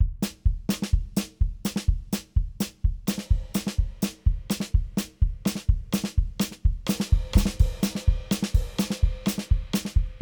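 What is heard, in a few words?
Battle Layer Percussion; Plants vs. Zombies Battle for Neighborville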